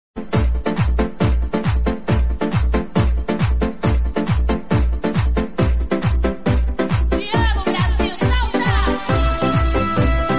Happy Holi Special Instrumental Ringtones

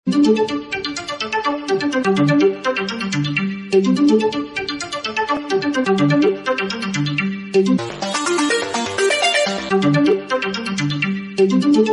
Samsung s24 ultra Ringtone

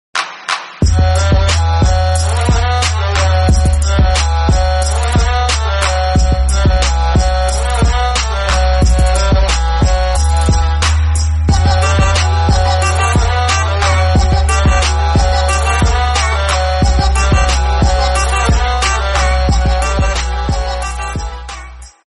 Instrumental Ringtone

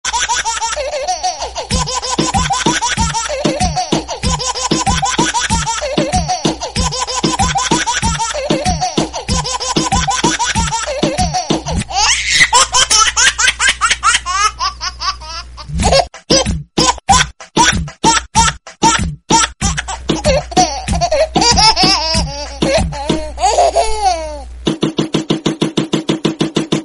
Baby Laugh Remix Ringtone

